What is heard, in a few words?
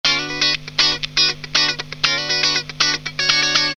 Turbo Clean Glass Funky Rhythm, Guitar Effects Pedals; Pro Co